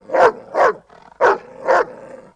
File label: bark; The Sum of All Fears